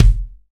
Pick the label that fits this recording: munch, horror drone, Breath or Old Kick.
Old Kick